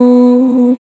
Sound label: Noises Vocal Shot; Synthetic's Serum Collection; Production Master - Vital Future Bass 4